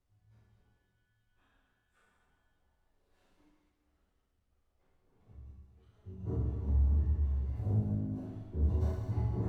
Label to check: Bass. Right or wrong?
Right